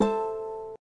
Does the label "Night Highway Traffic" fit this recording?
No